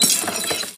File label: grindstone; Minecraft; Conquest Default